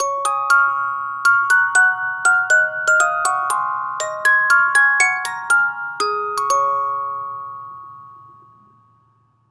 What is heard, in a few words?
Music Box; Assassin's Creed Syndicate